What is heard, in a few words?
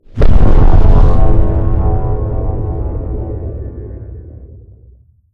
extra dimensional station death; ambient; Stellaris